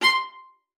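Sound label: C6, viola spiccato; Xsample VOL-5; 90 s Sample CDs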